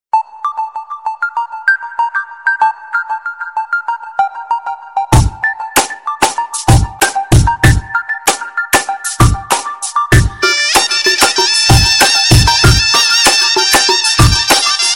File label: Arabic Dance Ringtone; ringtones